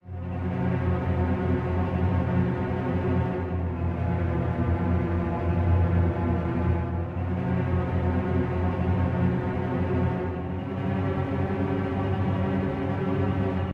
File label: scary; Undertale